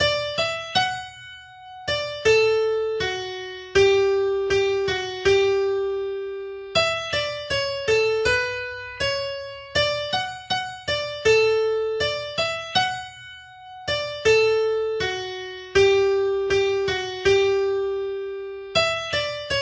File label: slow Bonfire Tune; Albert Farmers Bonfire Tune